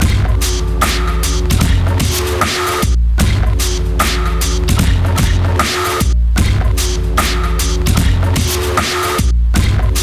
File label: breakcore breaks; blind (2)